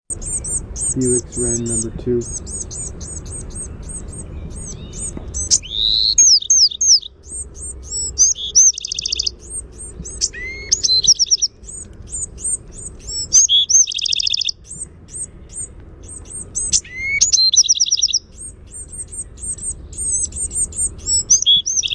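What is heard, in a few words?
song, Bewick's wren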